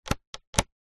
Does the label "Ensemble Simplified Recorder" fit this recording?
No